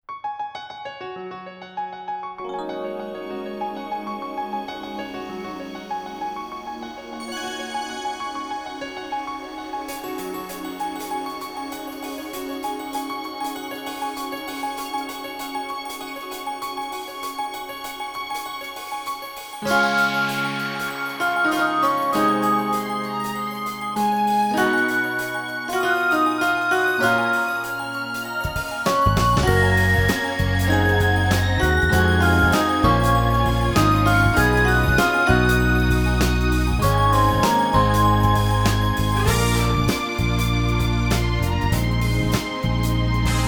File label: instrumental version; Kimi ga Ita Kisetsu